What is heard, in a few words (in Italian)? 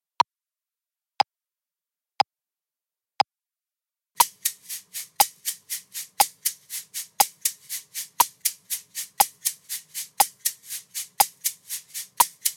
Sedicesimi con accenti, shakers